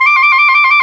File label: WND TRILL, Clarinet FX